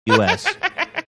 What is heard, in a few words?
short laugh